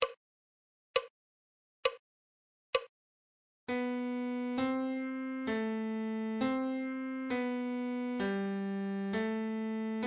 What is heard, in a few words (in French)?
Clé de Fa Adagio